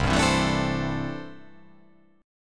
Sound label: C 6th Add 9th C down